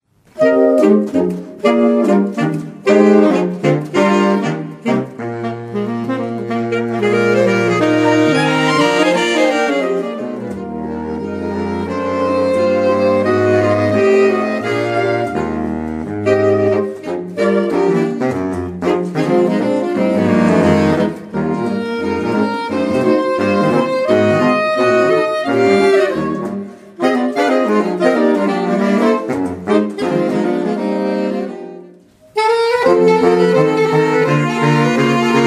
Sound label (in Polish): Kwartet Saksofonowy